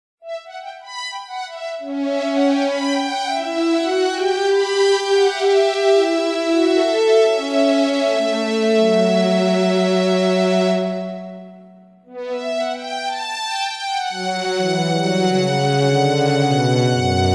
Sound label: Strings